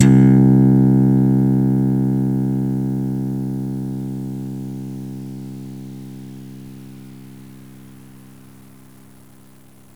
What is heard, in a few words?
Slap Bass; GMPlay